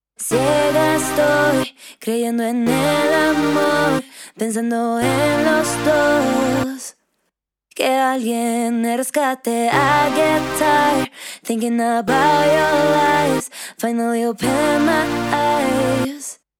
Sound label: Vocal Synth; Bitwig Studioにヴォコーダープラグインが追加